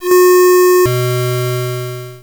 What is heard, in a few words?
alarm